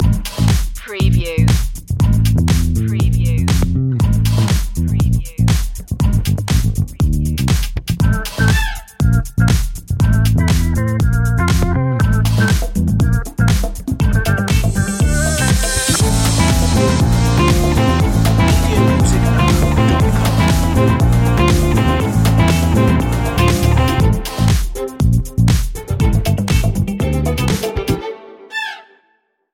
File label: salsa funk